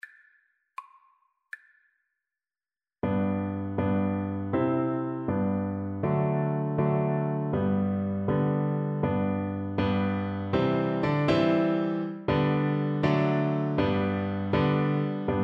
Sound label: Oboe